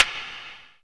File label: Perc FX, Percussion; Dance Synths